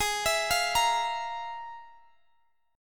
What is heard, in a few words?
F Minor Major 7th Double Flat 5th, G sharp